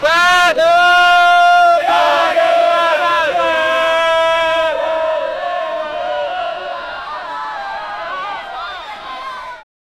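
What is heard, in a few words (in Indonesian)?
suara masyarakat indonesia, protest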